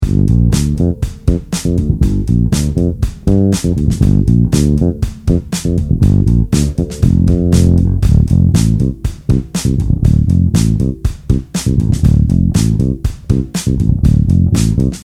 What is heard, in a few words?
Gminor back to Dminor